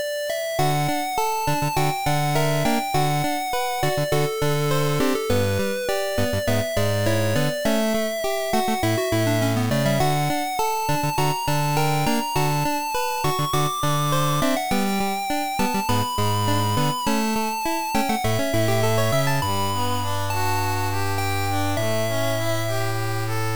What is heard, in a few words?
GB Sounds; New Bark Town; Pokémon HeartGold & SoulSilver